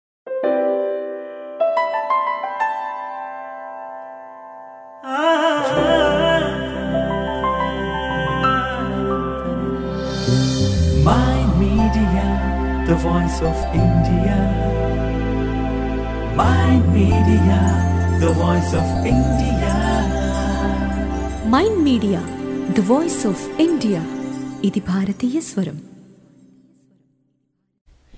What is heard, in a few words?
Romantic songs